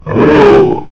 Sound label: beast roar